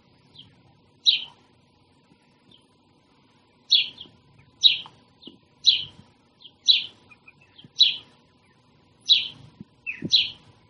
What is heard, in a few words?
dawn chorus sparrow pigeon other; garden